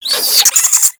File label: Infested Minion Pain; Warframe